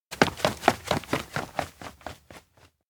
steps outdoor residential escape; miyasato; anemoi

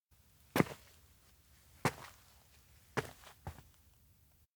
steps outdoor observatory heavy walk; anemoi

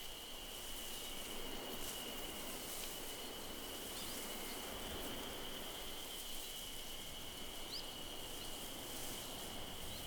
outdoor mountain road day; anemoi

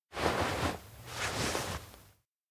offer; aino; anemoi

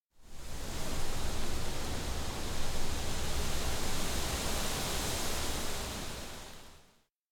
wind comfy; anemoi

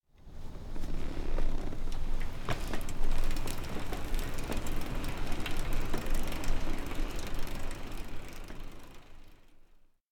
bike pedal away; anemoi

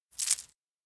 pendant show; aino; anemoi

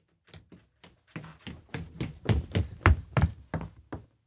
steps indoor abandoned cafe run up far; anemoi

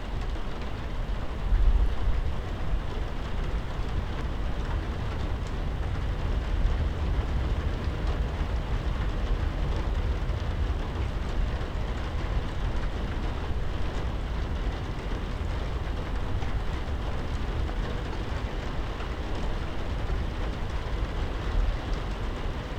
indoor classroom gale rain; LOOP; anemoi

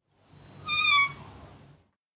door observatory open; anemoi

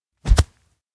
swing net down; anemoi

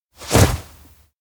top pull off fast; anemoi

